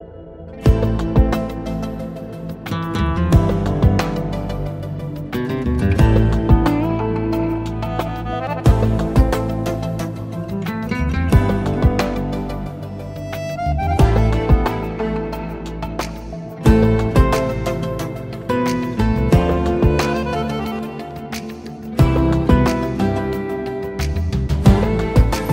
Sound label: music arabic ringtone